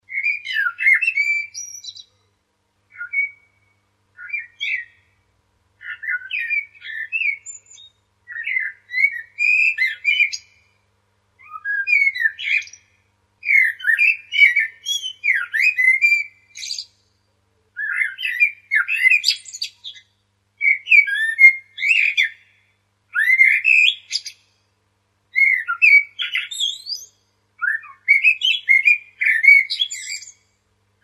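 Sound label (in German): amsel, blackbird; nistkasten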